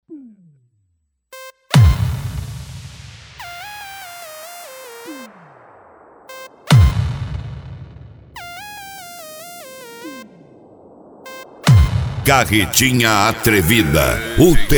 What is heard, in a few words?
reggaeton; Carretinha Atrevida Volume 2